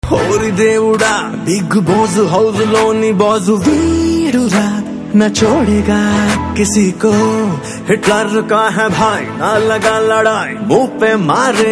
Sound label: Anthem, Telugu; Big Boss